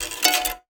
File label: Classical Guitar Extended Technique